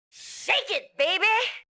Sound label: shake